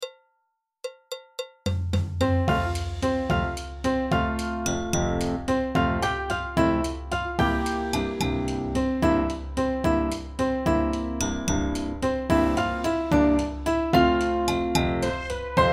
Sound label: Mexican Hat Dance Backing Track